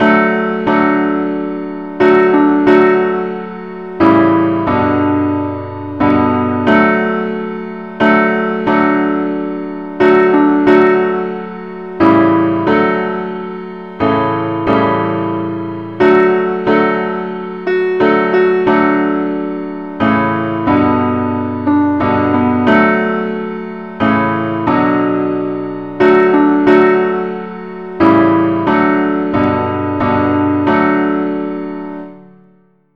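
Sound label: simple accompaniment key d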